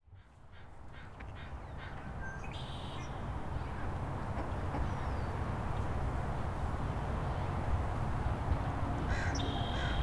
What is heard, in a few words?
ducks at echo lake